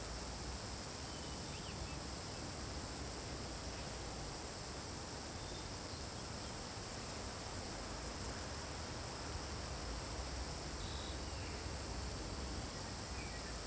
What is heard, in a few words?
swamp forest, ambience; Star Wars Obi Wan; XBOX